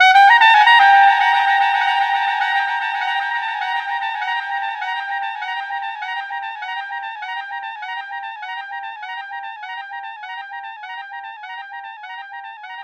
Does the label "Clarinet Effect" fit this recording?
Yes